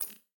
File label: button hover; joker pro